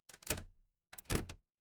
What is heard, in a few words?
door lock